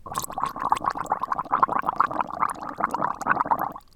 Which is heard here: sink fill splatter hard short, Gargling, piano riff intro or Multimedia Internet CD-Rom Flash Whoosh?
Gargling